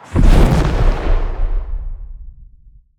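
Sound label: explosion norm; weapons; starruler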